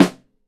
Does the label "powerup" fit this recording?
No